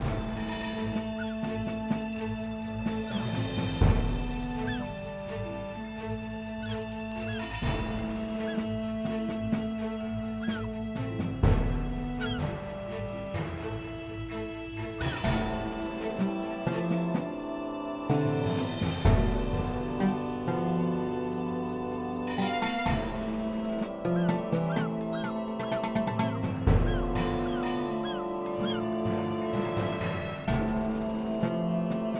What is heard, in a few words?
Background Loop; genesis; jasons Quest Desktop